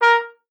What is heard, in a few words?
trumpet a#4, Trumpet stacc mezzoforte; Session Instruments